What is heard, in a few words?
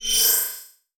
Digital; Adobe; Multimedia Internet CD-Rom Flash